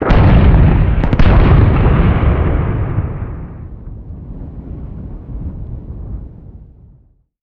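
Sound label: f15 missile explosion distant; gulag; Call of Duty Modern Warfare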